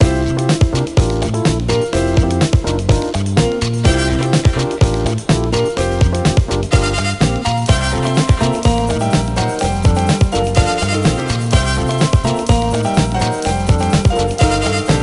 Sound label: Dance Music